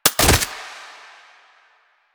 AK47 Mech; Warframe; Tenno; Weapons

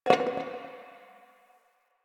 Garbage Metal Can

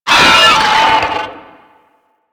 Moa Charge Scream; Warframe

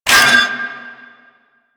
bird three scratch; Ambience

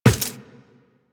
Run Default, Footsteps; Warframe; Tenno

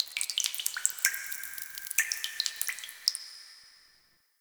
Ocean Cave Drips; Warframe; Grineer Ocean

